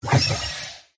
next power up switch hybrid mobile collect special power up buff